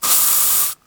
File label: Deodorant